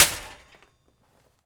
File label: Prepared Drums